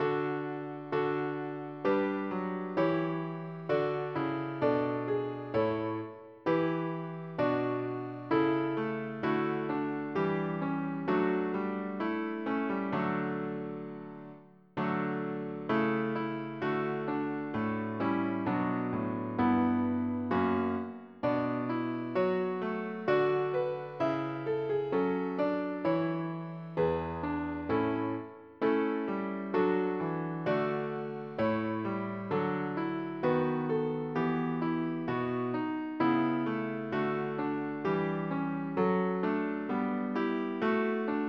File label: CHORALE; ShareWare OnLine Volume 2 (CMS Software)(1993); music